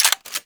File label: Hand Shotgun 2D Mech A; Warframe; Tenno